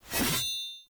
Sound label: Two Handed Axe Whoosh Stab; Weapons; Enemy; Axes; Sinecure Two Handed Axe